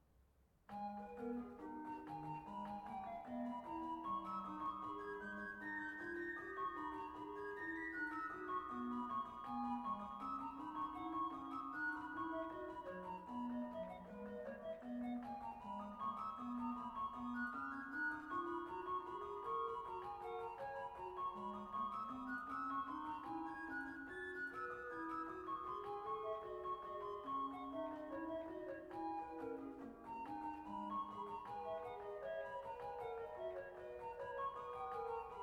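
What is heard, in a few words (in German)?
Sei gegruesset, Orgue; BWV 768; Freiberg; J.S. Bach – BWV 768 – Partita en sol mineur: Sei Gegrüsset, Jesu Gutig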